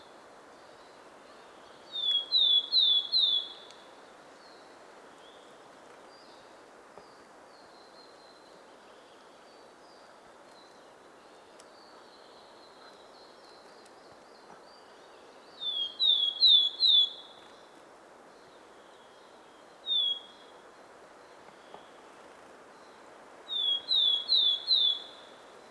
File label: willow tit song